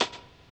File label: RAGGA; Spectrasonics; Metamorphosis; RAGGAVYNL